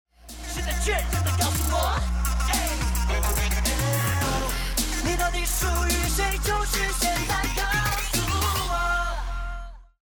round ten sec count; music kits custom

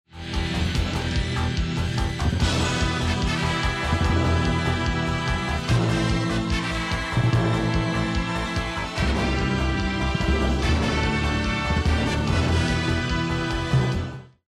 start action; music kits custom

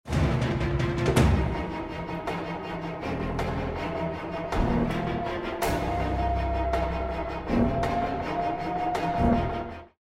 round ten sec count; Outlast

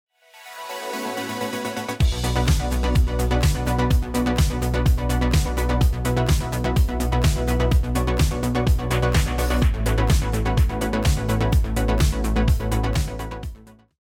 won round; Outlast

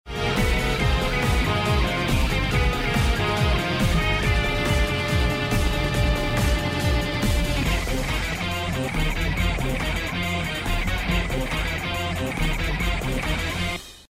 won round; music kits custom

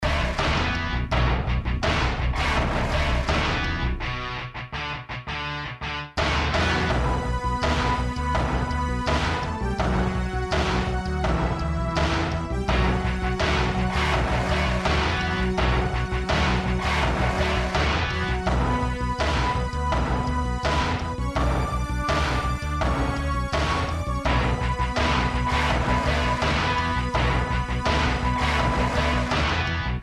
bomb planted; music kits custom

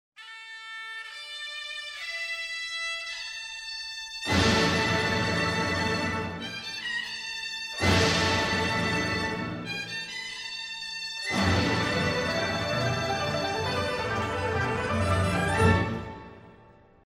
start action; music kits custom

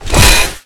Link Gun Mag In; Requests Studio; UT3

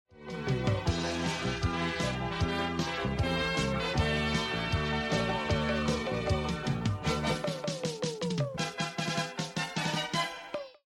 bomb ten sec count; music kits custom

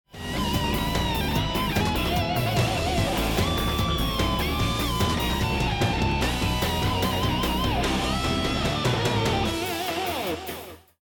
bomb ten sec count; music kits custom

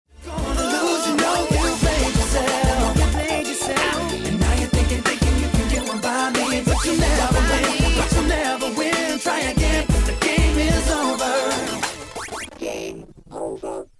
choose team; music kits custom

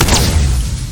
revolver; weapons; xieshen

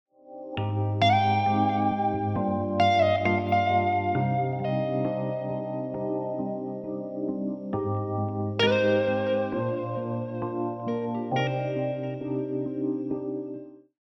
lost round; music kits custom

